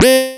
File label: Frogtalk, FM; Polyend